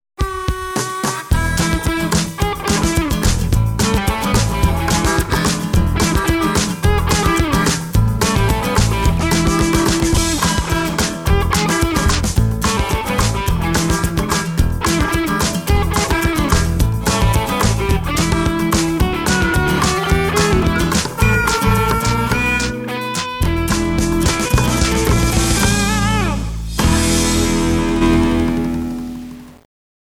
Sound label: Country Guy w Guitar